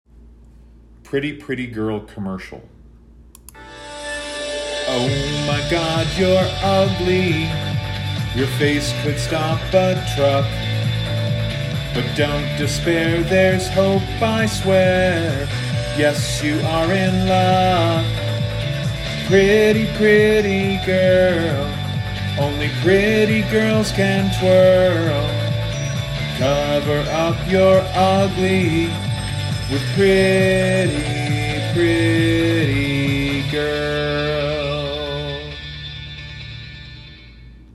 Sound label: Pretty Pretty Girl Vocal